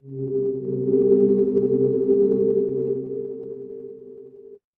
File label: magic scifi alien